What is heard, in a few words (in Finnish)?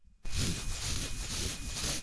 rapsuttaminen